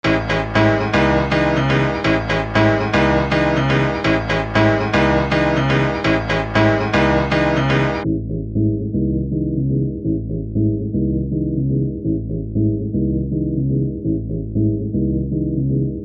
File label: piano